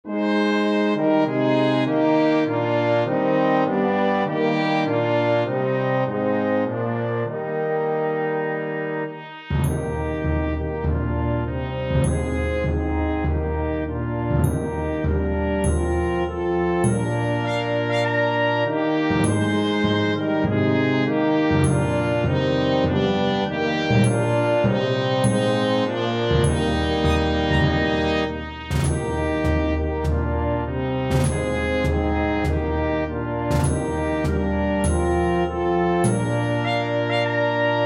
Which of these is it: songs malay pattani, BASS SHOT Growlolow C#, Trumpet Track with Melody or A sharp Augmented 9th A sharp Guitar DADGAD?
Trumpet Track with Melody